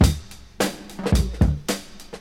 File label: 108 bpm drum beat e key, Free breakbeat - kick tuned to the E note. Loudest frequency: 1257Hz